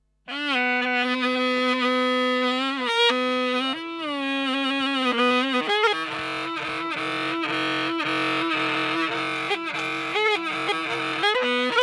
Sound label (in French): Solo de clarinette